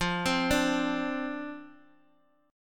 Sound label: F 5th 6th F, chord; F56 arpeggiated